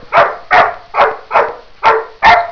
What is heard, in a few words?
dog bark